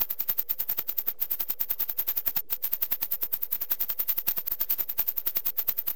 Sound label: Noise shaker